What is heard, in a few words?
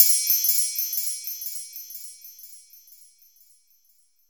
WINDY CHIME; MISC.PERC